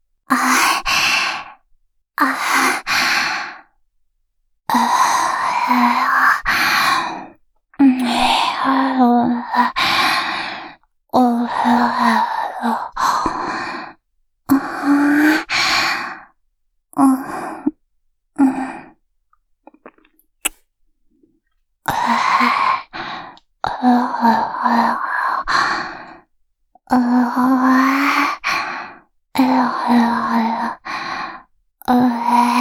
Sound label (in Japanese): 舌絡め, キス, ASMR